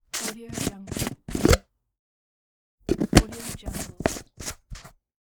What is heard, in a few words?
jar open close